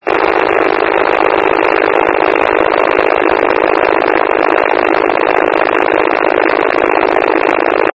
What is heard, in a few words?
wcalert, County Wide Paging; County Wide Paging Archive